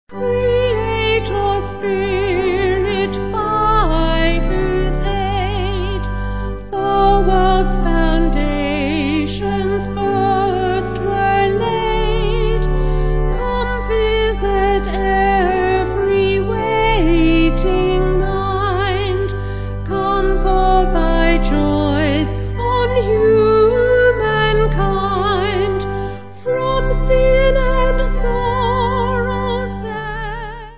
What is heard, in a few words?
Vocal Organ